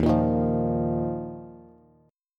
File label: Eb Suspended 2nd, Bass; Ebsus2 strummed; JGuitar